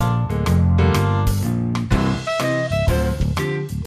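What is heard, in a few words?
music instructional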